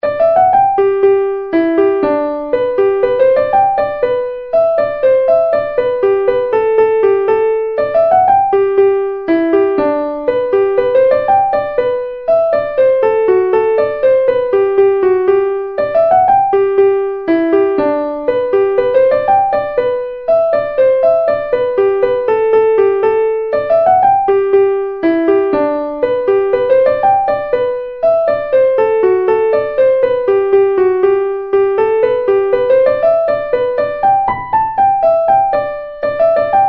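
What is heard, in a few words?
Hornpipe, Traditional Irish Music; Cooleys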